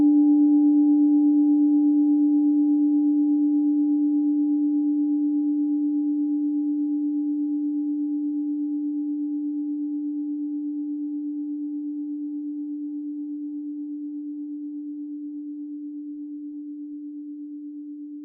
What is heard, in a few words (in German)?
klangschale set; Durchmesser = 20,8cm